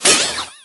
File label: shield skele lost